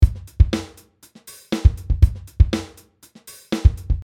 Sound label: drum dynamic